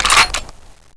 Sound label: m249 cover up, weapons; cstrike; DEX